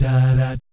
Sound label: "DAH DAT", vocal jazz fx